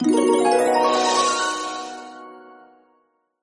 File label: Jingle Win Hand High